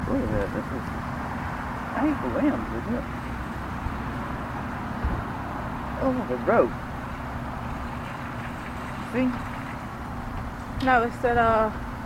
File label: sounds of chains